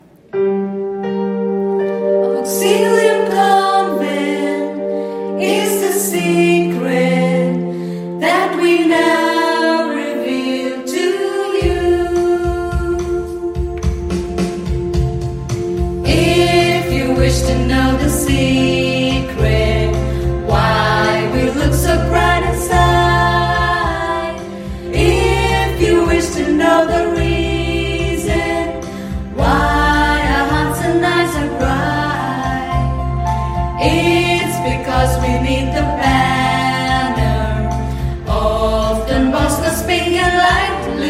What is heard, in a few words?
School Anthem